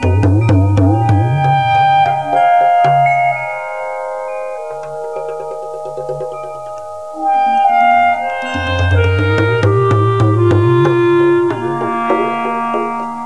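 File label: rhythm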